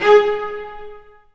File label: Cel Spc G#1; Digital Sound Factory Virtuoso